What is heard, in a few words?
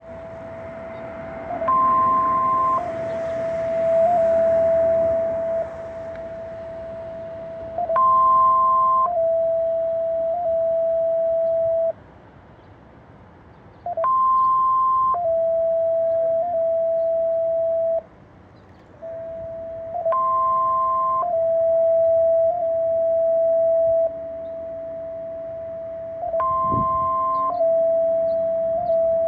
traffic light; stoplight at UCSD repeating a beautiful phrase with unclear purpose; excerpt of the original field recording